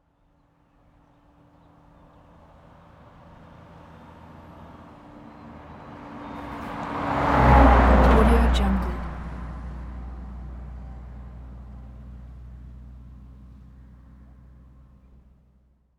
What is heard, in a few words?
large truck pass by